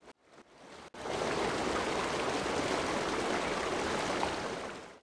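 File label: Dark Valley Water; Seer; GamigoNA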